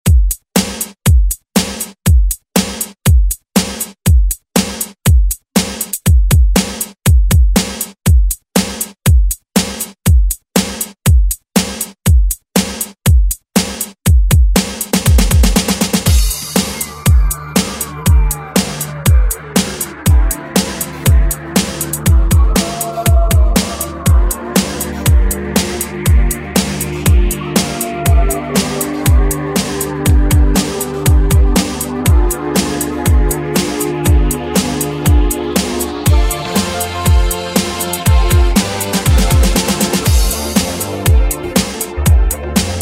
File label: Hit Oldschool Redrum; Deejay Hit